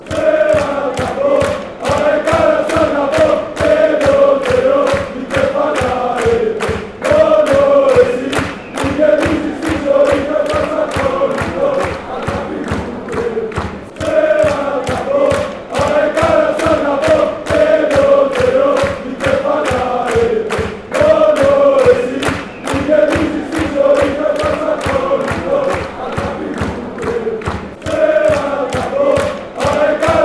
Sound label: chant; aek athens